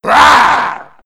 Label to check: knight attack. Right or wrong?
Right